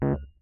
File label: C#2, Rhodes Mark II, hammered; mkII 88 key; CEPCo Sample Series Vol. 3